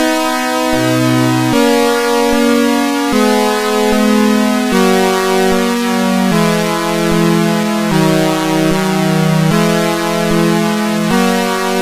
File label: tune, sid, MOS6581R4